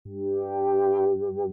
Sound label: tone